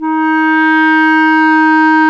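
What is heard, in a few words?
wind; Roland - LA Composer vol. 3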